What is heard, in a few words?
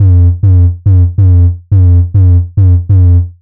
140 F BASSLINE, Dubstep; Loopmasters; The New Sound Of Dubstep; BASS LOOPS